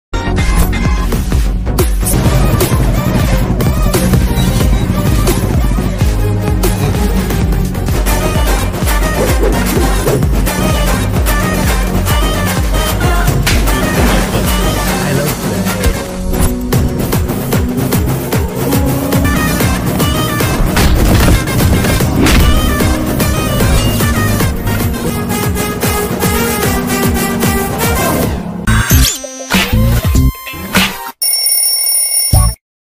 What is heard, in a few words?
movie trailer bgm ringtone; Gully Rowdy